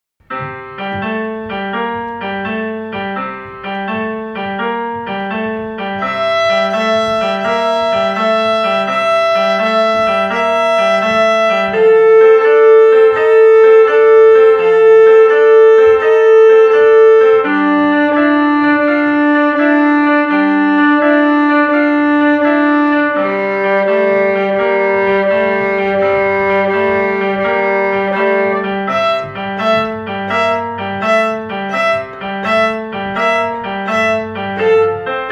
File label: open string blues with violin